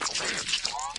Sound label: infector idle; flood